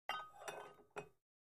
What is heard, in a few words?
Free Weights Exerci